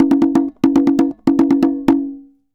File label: Congas Poly 120, Drums & Percussion; Best Service; Festa Latina VOL-1